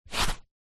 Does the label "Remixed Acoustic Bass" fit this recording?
No